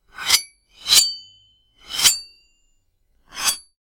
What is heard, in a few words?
sword unsheathing